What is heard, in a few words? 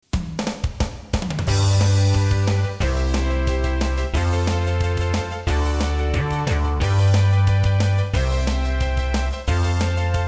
ice cream stop vocal